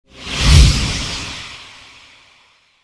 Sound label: gallery Open Sound